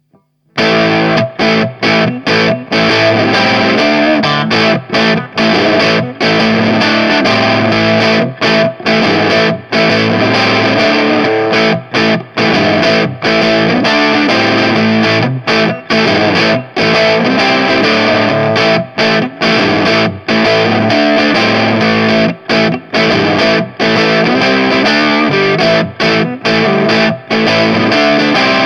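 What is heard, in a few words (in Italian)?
ritmica vintage, guitar effect; Kungfuzz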